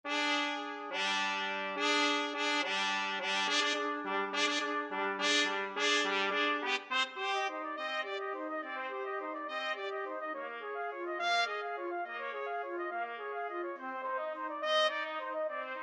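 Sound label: danse macabre saint saens 2 trumpet; trumpet duet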